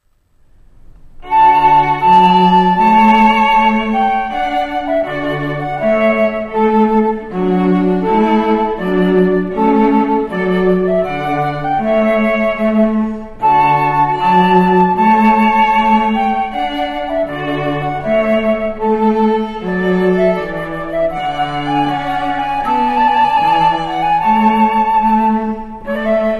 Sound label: trumpet tune, trio